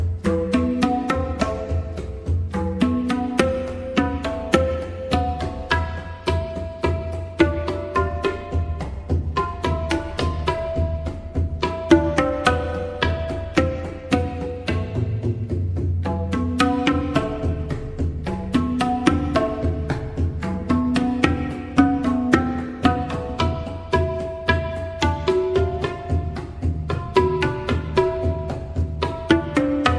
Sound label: Ringtone